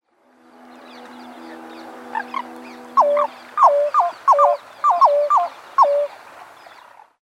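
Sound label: yakana fazanohvostaya voice, pheasant-tailed jacana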